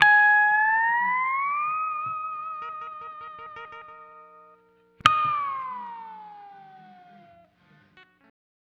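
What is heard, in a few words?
Steel Guitar, G# Dry; Zero-G Kocktail Kollection; Construction Kitz (Lounge); Volcano at Sunrise 074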